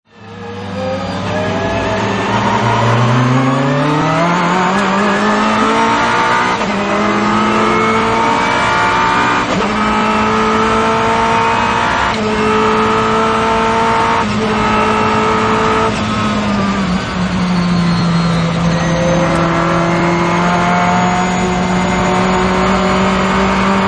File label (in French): Ferrari F40 interieur